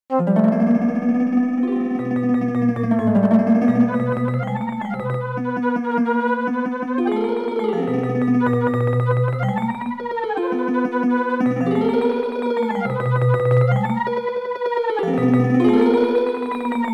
twenty four square steps to an octave, fractal music; hocketting